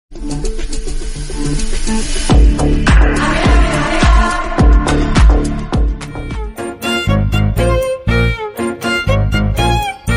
violin ringtone

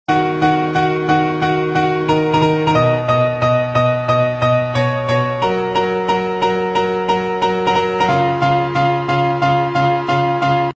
ringtone

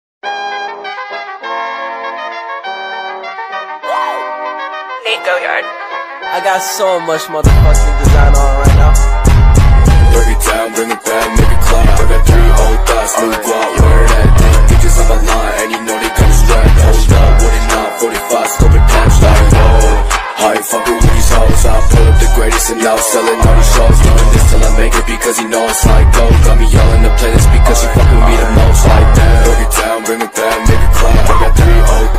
ringtone

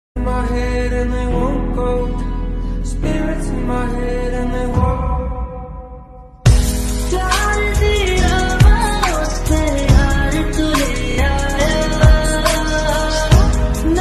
remix ringtone

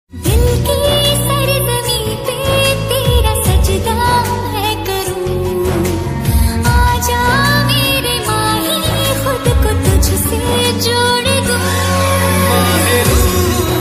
hindi ringtone love ringtone

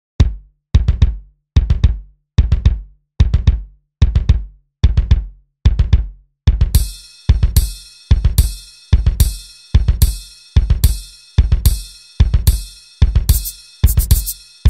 piano drums strings

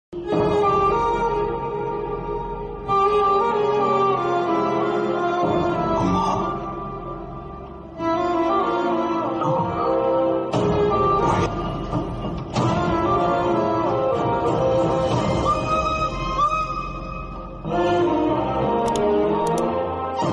sad emotional bgm ringtone telugu; waltair veerayya

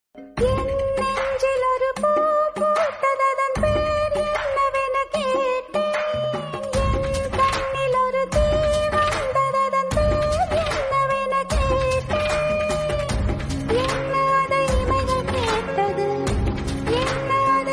movie song ringtone

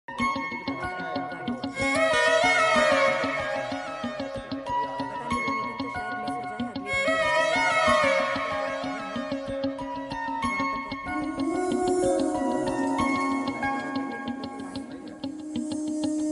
love bgm ringtone; Ishq movie

